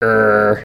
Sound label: Dragon Emote